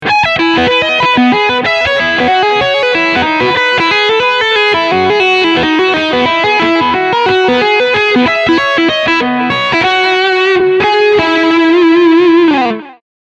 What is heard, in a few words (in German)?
Leadsound Steg